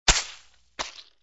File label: drop sandbag miss